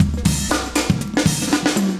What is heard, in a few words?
chique 120, loops, 120bpm; Sound Effect Library